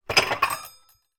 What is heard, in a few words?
household metal bowls small place in kitchen cupboard; zapsplat